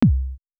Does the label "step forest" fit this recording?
No